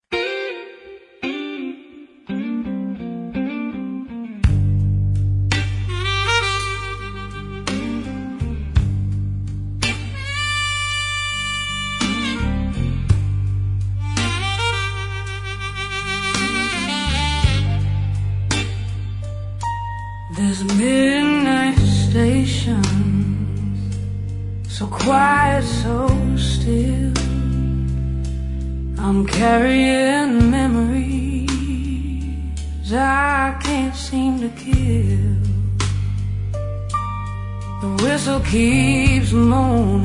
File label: Soulful Blues Ballad